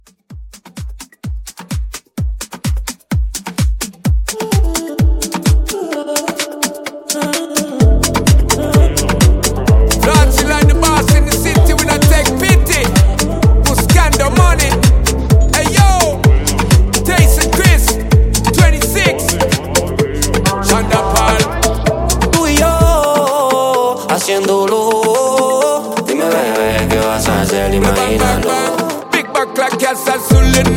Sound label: Club Mixshow